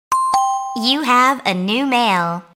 New Mail

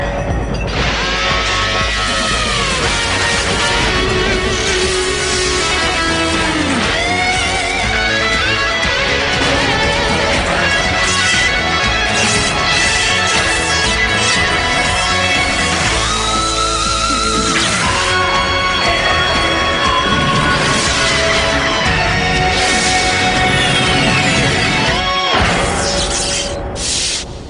Solo; Ironman

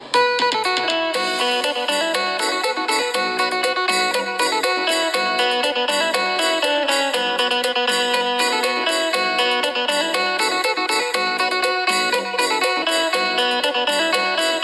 Thai flute music

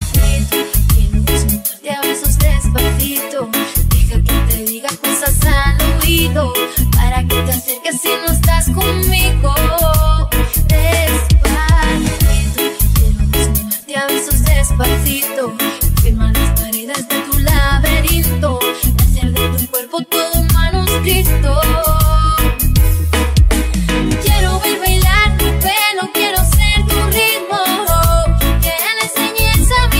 reggae

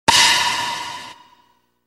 Pokeball pop

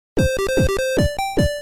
8-bit

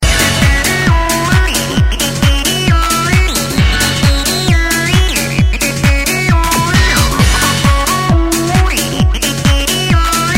Dj Effect

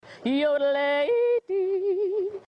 Yodel

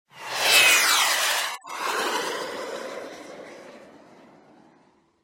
Rocket Sound

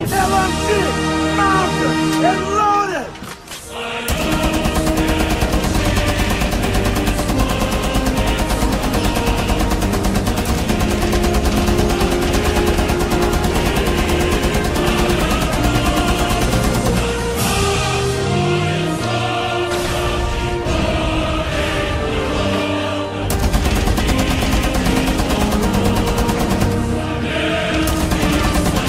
LMG; Tachanka

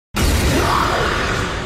powerup; Goku Black

